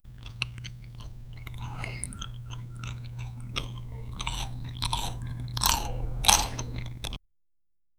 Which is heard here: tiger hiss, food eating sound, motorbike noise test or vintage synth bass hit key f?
food eating sound